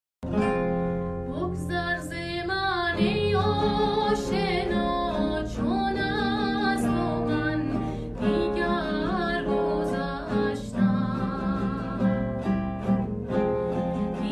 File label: Female